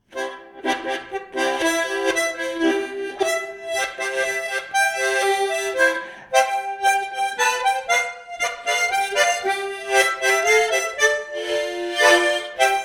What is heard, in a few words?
Menuett SKYDIVER48 C